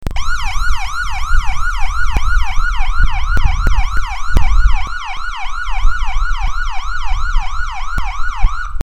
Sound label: Yelp, siren; whelen